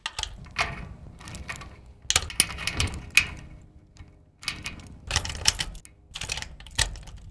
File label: unlock; Mafie 1